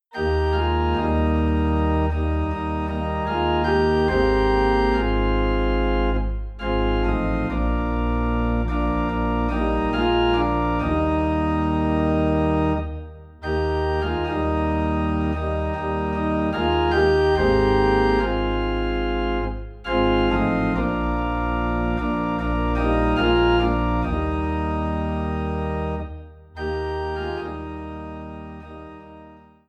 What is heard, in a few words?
Organ in E Flat